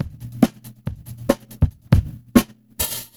LOOP FX, Big Beat Drumloops

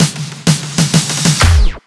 Fills 128BPM, Clubsounds

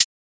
drum hat soft tick; Virtual Riot; Drum Hits

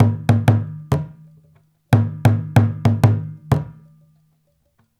93 LAMBA, African Ethnic Drums; Spectrasonics; Supreme Beats

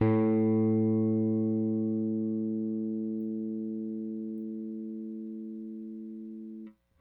Rick Thumb A, Rickenbacker Bass; Airwindows

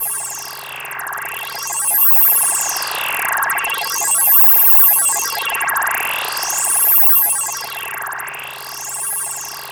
SYS-100M LFO; Distored Reality

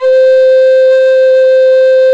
SOP C 3, MOECK; SoundBytes - Early Patches

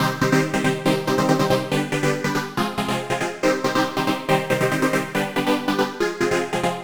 Arp 140bpm C, Trance

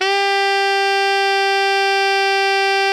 alto FF G 3, saxophones; Greytsounds; Philip Wolfe David Crozier's Saxophones

